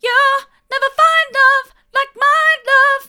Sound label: hard, vocal